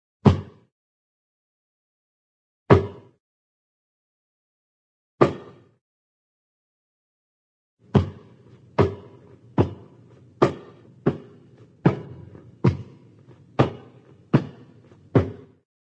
basketball, catch ball, several passes and catches; cartoon